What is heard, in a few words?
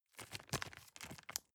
plastic bag small turn soft